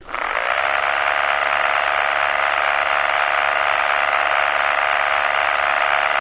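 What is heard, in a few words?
Motor Small Low Speed, variable speed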